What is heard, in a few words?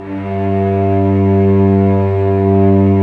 STR, Vcs p Amb